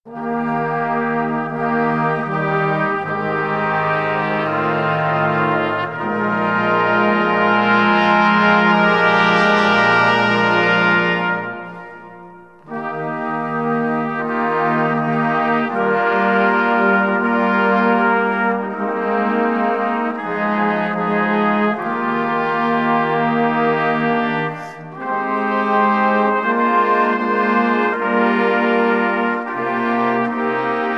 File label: brass